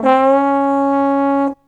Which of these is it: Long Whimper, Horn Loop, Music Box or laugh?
Horn Loop